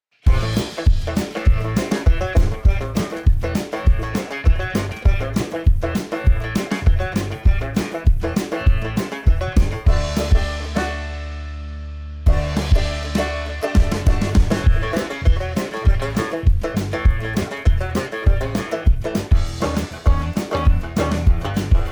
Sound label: riff raff country style